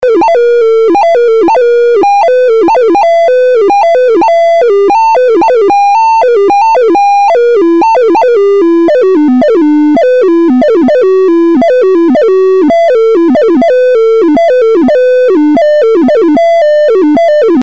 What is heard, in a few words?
Blues, CSG8580R5; 128 Byte Blues BASIC